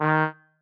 marimba trombone; ゆめ2っき

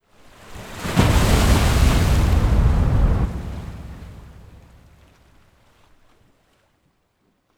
Water Buildup

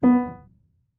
marimba piano; ゆめ2っき

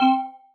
marimba; ゆめ2っき

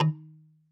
marimba kalimba

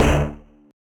marimba steeldrum; ゆめ2っき

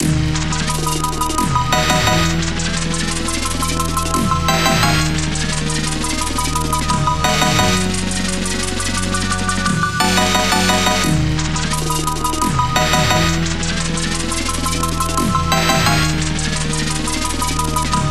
dnb; ゆめ2っき

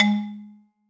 marimba

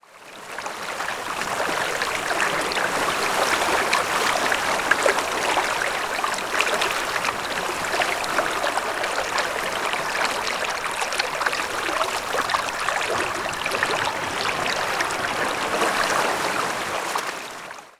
water

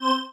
marimba spacesuit; ゆめ2っき

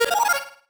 marimba dice; ゆめ2っき

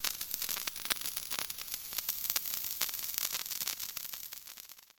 fireworks hand

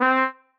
marimba trombone

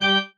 marimba grave; ゆめ2っき

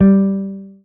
marimba guitar; ゆめ2っき

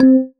marimba raincoat; ゆめ2っき

